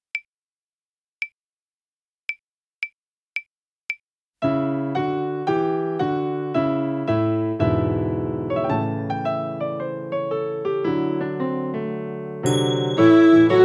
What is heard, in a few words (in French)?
Troisieme blues Alto